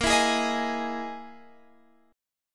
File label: E Major 7th Flat 5th chord